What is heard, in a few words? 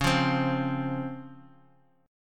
Db Major 7th; strummed; JGuitar